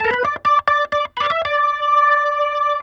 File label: Organ Lick; Ambient Grooves; Organs Licks